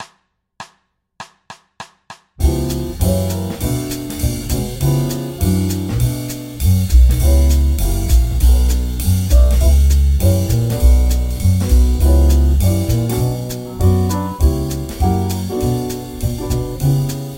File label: C#m7b5-F#7-Bm7 2bars Loop 200